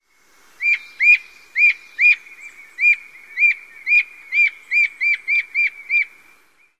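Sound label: Gray Jay loud whistles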